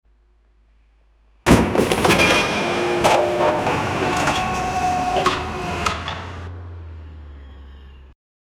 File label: truck crash